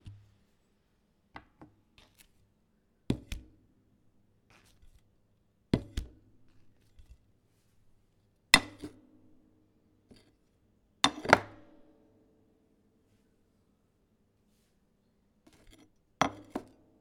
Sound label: Plate On Table